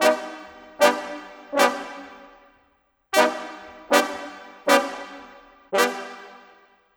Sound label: Brass, Cinematic; Sonic Foundry (Sony Creative Software); Orchestral Series VOL-3 - Cinematic